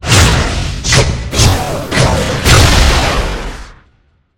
Dark Slash effect

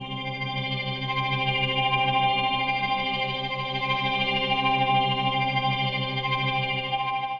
System Skill Bar Down

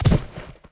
bodyfall

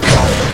Dark Slash effect

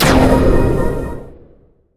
Soul Control effect

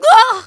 pain

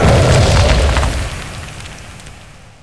down dust

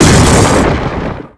lightning

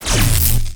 Electric Bomb; Helroid

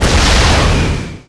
launcher shot; Helroid

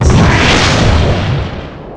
shot earth

